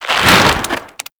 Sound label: Infested Devourer Movement Whoosh B; Warframe